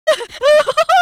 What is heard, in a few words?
victory sound; nora